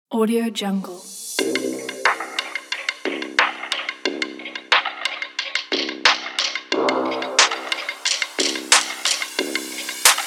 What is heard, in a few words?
trap